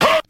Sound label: "huh"